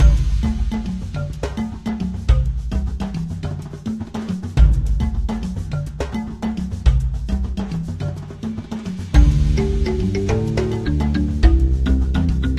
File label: Sting